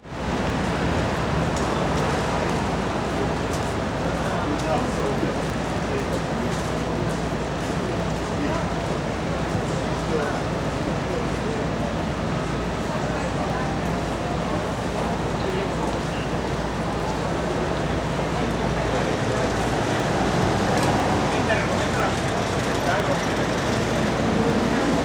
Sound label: traffic